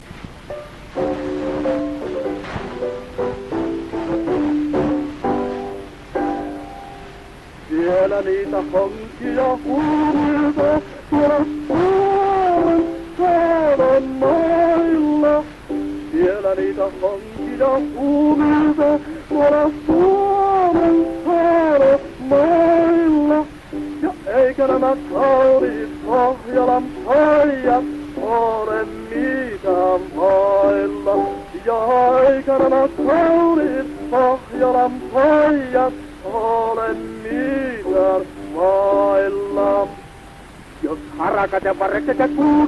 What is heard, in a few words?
Gramophone